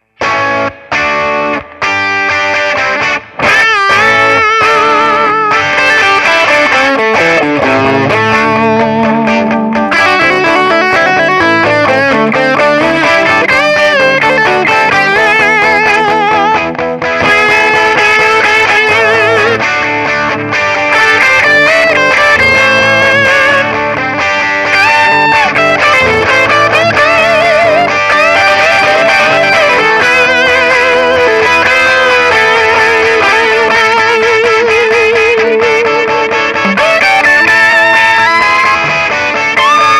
SG Rhythm TH Lead Brite Down

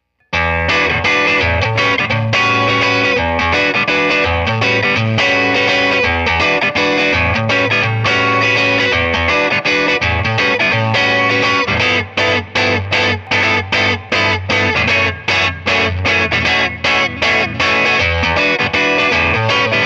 Strat Neck PU Brite Up

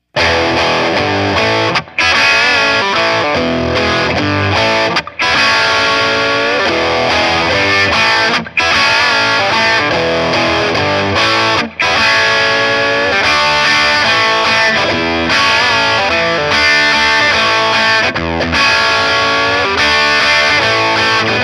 TH Dirty Rhythm Brite Up

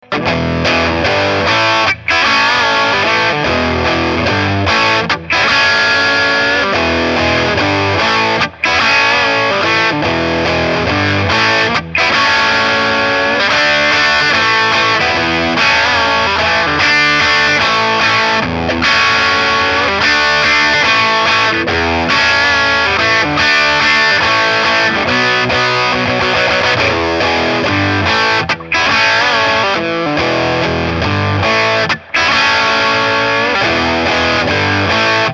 TH Dirty Rhythm Brite Down

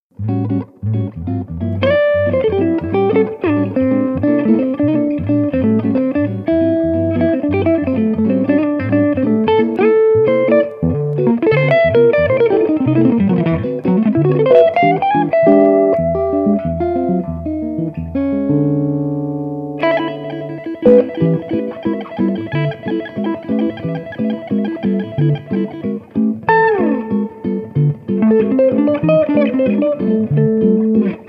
Archtop Brite Off

